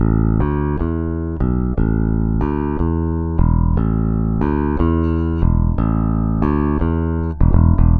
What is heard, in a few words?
SA BS AM, BASS SALSA